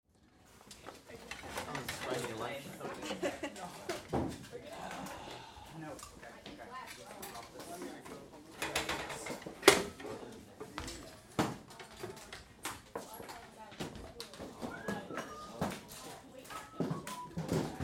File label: Activity Class Cleanup Music Stands Instruments; Schools; Big Room Sound